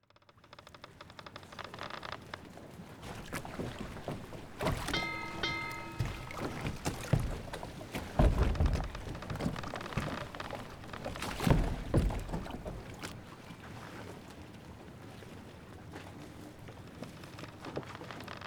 Docks Ambience Calm

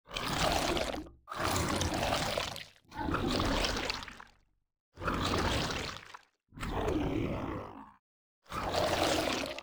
Slime Vocalisations

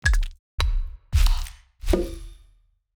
Nature UI

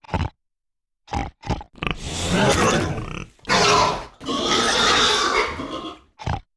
Glacial Boar

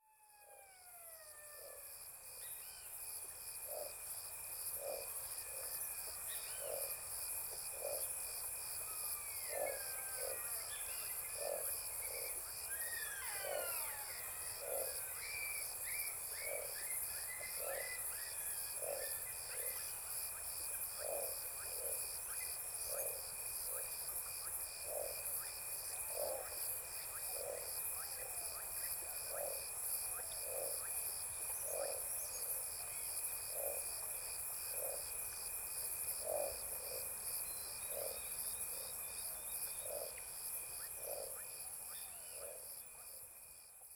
AMB Meadow

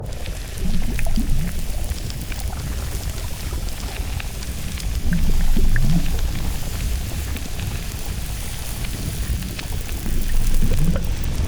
Lava Burning

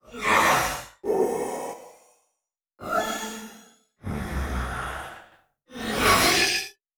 Skeleton Archer Deaths

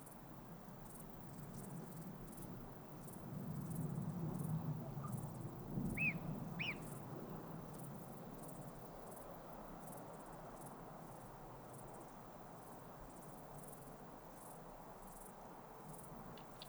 Settlement General Ambience

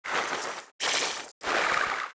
Spider Hit Reaction Vocals, Spider; Spider Moltenweb

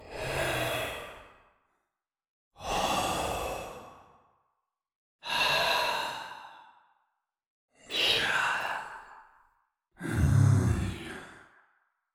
Elves Deaths; Twilight

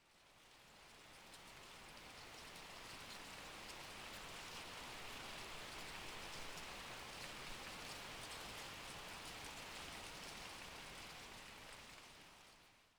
Leaves

